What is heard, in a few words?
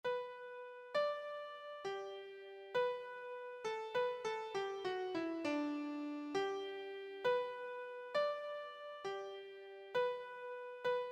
praise him, keyboard